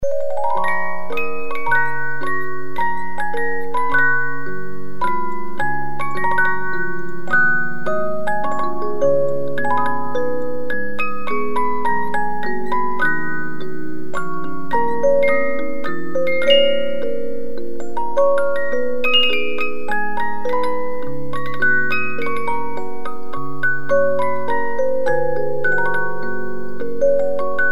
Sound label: Carmen, 50 Note music box movement